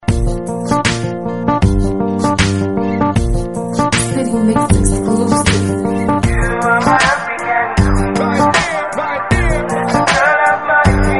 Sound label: reggae